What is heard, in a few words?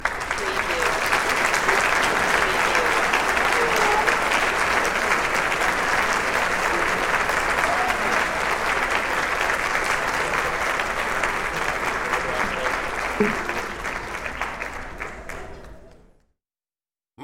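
Large Crowd Applause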